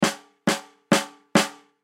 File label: Drum Snare; Snare 14 Inch Pacific Hit Multiple; Drum Kit Sound Effects; Blastwave